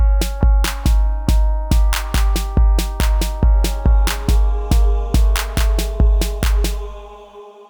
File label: loss